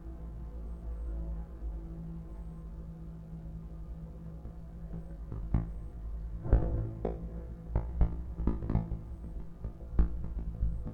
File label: noise; Rimac noise 6